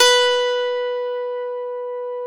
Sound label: lion harp H 1; SoundBytes - Early Patches